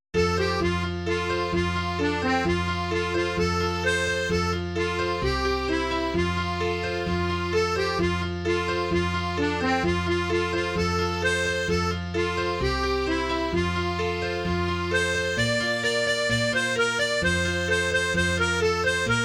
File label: With Arpeggio Chords